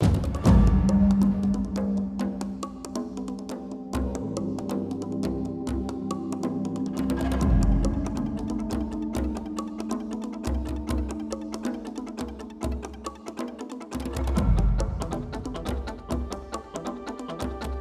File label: Music Ingame Bgm Tyrant Forest Loop